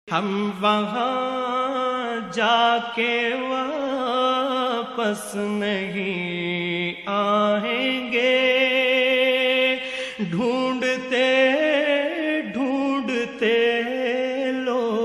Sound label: Naat